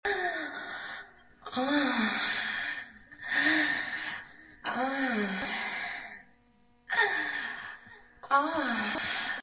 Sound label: gun cock